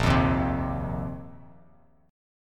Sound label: Bb Suspended 2nd Suspended 4th, Bass Standard; strummed